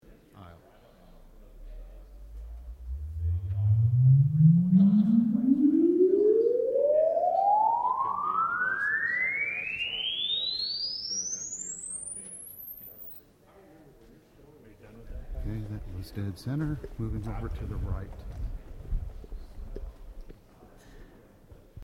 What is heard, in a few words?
raw sweep; OKC